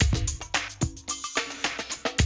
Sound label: Classic DrumLoops, 110